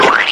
squashing

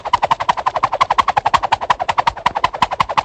arm flapping swishes

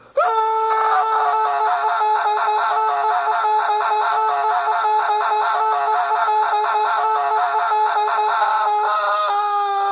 screams for 10 seconds; jeffy

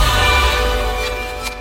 respawn; two time

